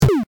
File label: Mole Hit